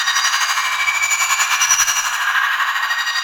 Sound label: Sceptre Kuva Extract Loop; Warframe